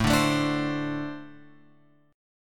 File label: A-Minor Double Flat 5th A; Ambb5 Chord {5 5 7 7 X 8} strummed